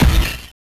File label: Glass Smash; Sonic 3; Carnival Night; M8; Genesis